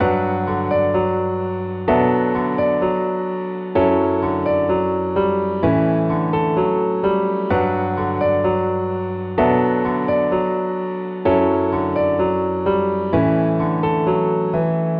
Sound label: Piano Loop, 128 BPM B Min; Instruments; Loops; House; Scarlet